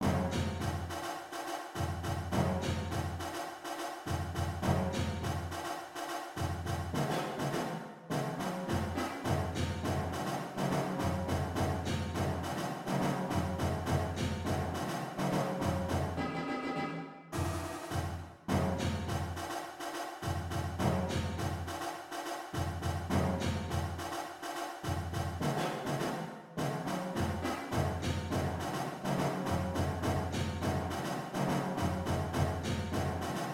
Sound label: 94 bpm